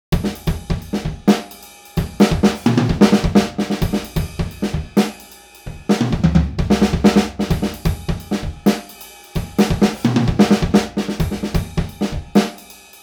jazz

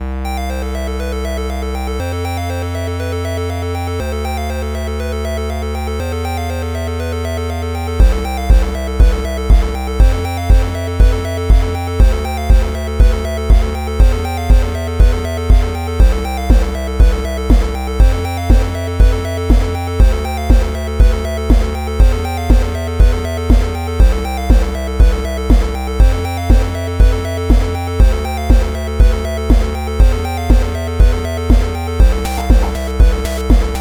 famidrums

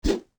swing vox vocal swipe stab

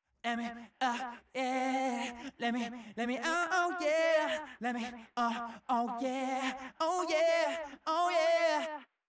vocals 5 slap vintage

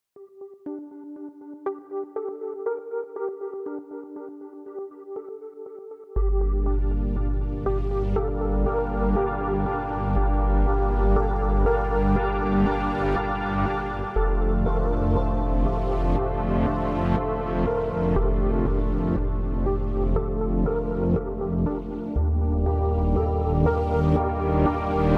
pad motion; poly cre8

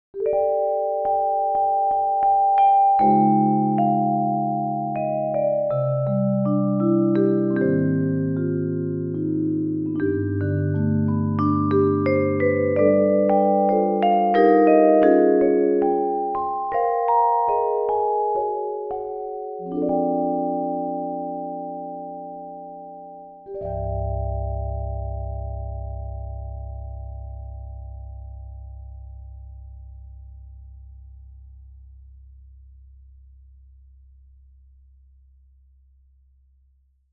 vibraphone vibrant

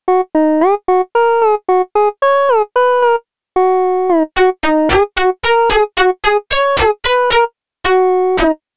phase distortion

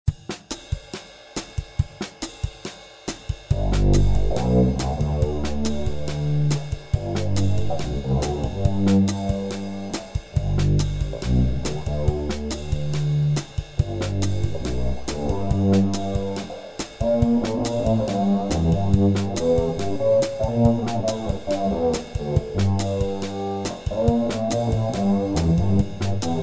bass solo; druid bass

